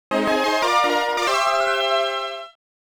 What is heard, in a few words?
Great Clear; tinkle pit